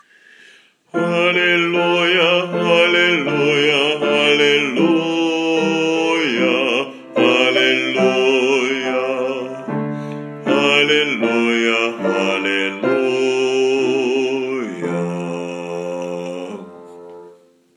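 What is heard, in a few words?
Alleluia, bass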